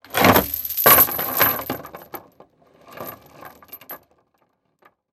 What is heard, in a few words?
Impact Chain Toss On Wood; Impacts